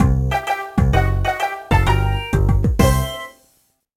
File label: Lose; Mame Goma 3 Kawaii ga Ippai